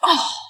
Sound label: Exasperated